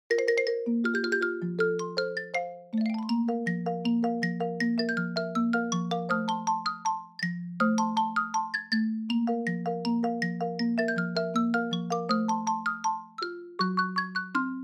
Dotabata Panic Fast

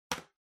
Trackpad Click Far L Hard

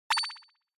Onoma Surprise High Short

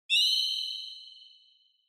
Flash Low Long; Onoma; オノマトペ 光る; 低 長

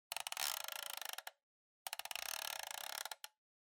Clock Alarm (Dial)

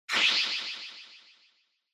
Motion Agility Delay

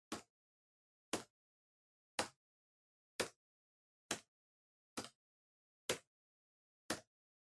PC Keyboard Far Single Mid

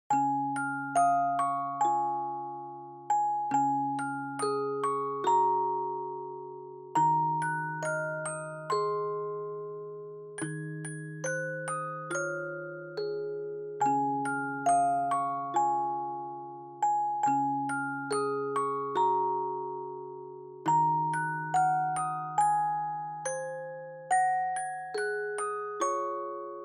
Dry Simple Slow; bgm; Jingle Bells

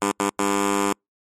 Quiz Wrong Buzzer

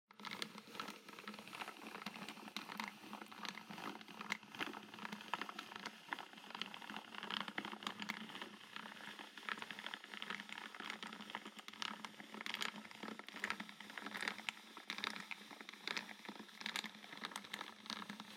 Music Box Foley Crank

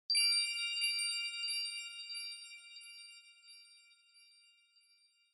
Onoma Sparkle Short

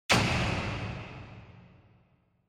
Spotlight Long

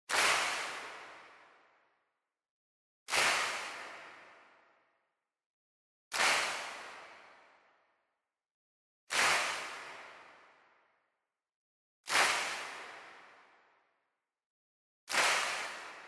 Handclap Far Slow

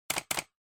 PC Mouse

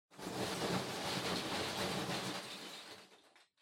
Loose Fill Packaging Far Rustle; 梱包材

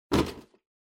Drawing Box Hand In Strong

